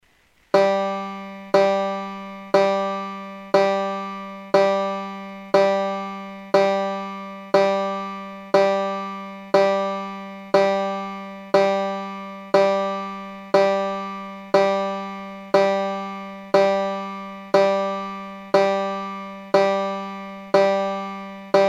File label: G3 Banjo